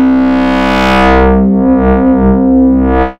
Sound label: modular sounds, 150, C; dharma